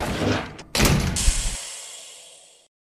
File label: airdrop unlock